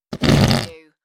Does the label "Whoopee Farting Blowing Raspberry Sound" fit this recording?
Yes